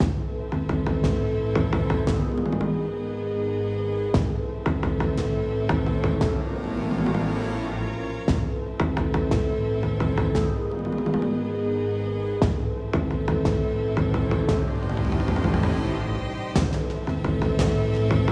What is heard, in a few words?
Dialog Danger Loop; skykeepers; ps4 windows xbox one gamerip 2017